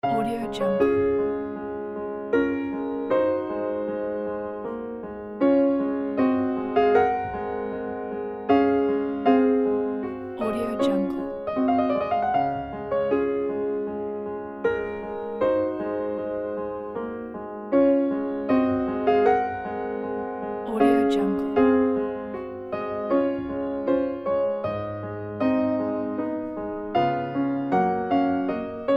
prelude 15 raindrop; chopin

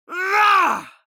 human vocal male scream forceful short

